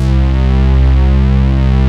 SATURN POLYS, synths; Pro-Rec - Synthsonic

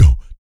VOC PERC, Vocal Planet Jazz FX BASS PERC

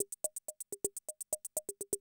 CR78 125, drum loops

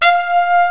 OB-8 brass; Greytsounds VOL-1; OBXA

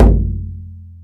BODHRAN; ETHNIC PERC; Instruments & Percussions; InVision Interactive; Lightware VOL-1

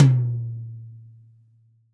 TD-20 Tom A, Roland TD-20 Toms

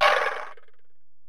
Ac Perc, Drum Machines Alesis DM-PRO

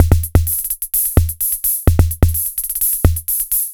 Analog 128 bpm, retro drum machine samples, Loops; SR88

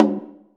DJEMBE; AKAI S-Series CD-ROM Sound Library VOL-5

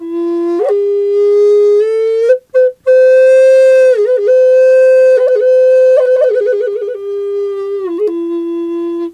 F wild flute; Big Fish Audio - Didgeridoo and Other Primitive Instruments

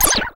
FX EFF, ANALOG; Sound & Vision - Gigapack II

